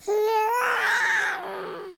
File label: roar, baby; CosmicRage